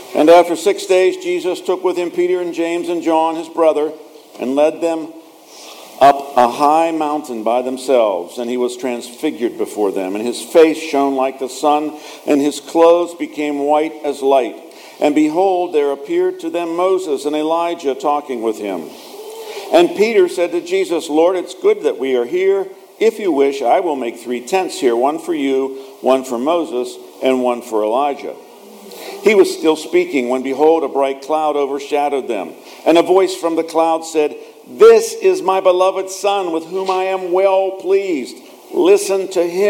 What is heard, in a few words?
Gospel